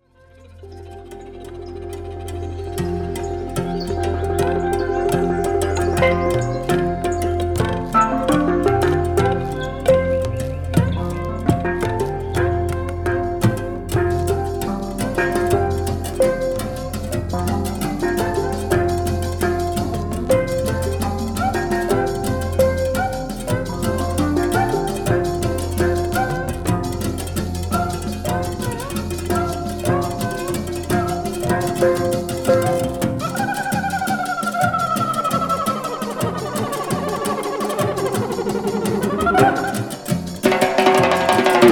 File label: four drummers drumming